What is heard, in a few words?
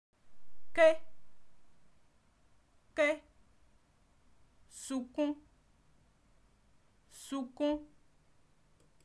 CRY